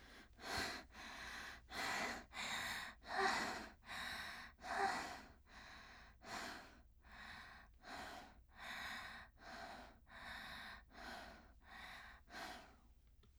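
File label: voice out of breath; ikigire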